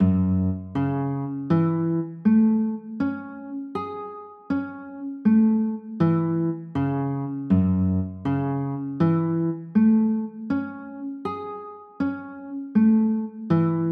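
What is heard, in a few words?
G flat m9 chord, guitar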